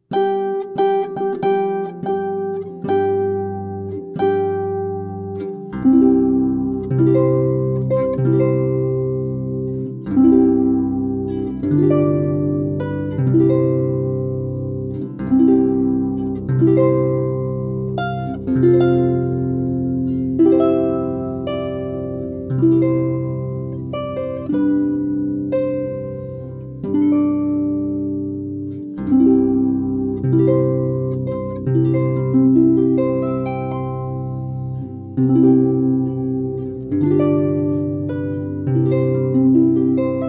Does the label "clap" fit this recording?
No